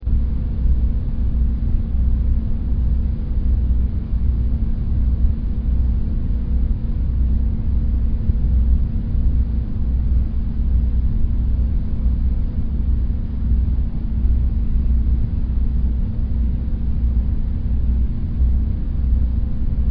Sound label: HUM